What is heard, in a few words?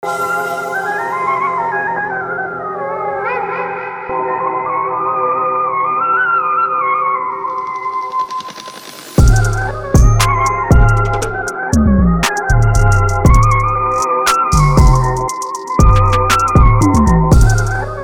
BGM; Promo